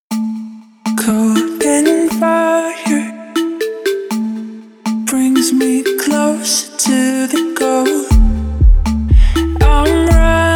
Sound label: duo violins; far from home